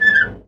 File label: metal door move; doors